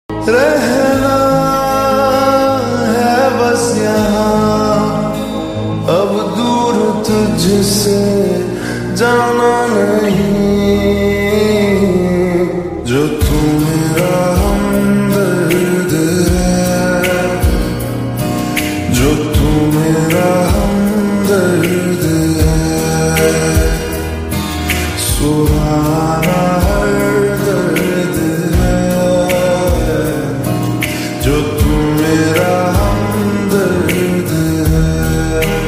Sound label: Love Ringtone